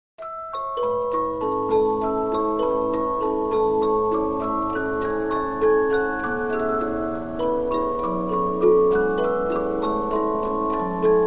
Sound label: Polyphon, 15inch disc musicbox